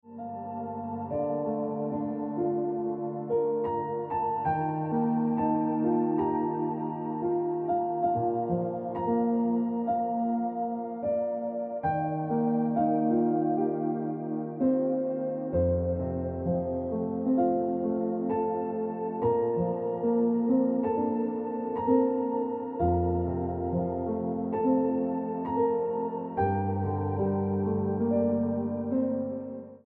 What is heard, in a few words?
Ringtone Piano Music